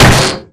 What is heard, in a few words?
bullet 40mm titan pierce; Titanfall 2